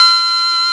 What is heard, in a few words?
OC-NZ BP C5, SYNTHESIZER